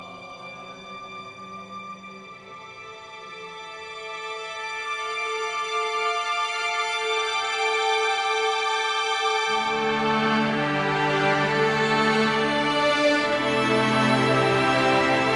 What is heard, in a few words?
Defeat